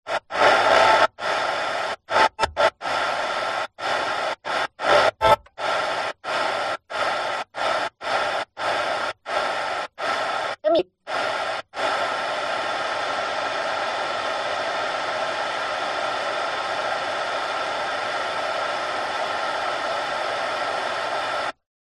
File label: vehicles car radio static tuning through stations speaker